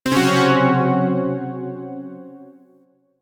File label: Shawzin Hirajoshi Chord; Zariman Shawzin